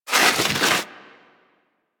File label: Armadillo Roll Snow; Warframe; Hunting Critters; Foley